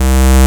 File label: Proj Alt Fire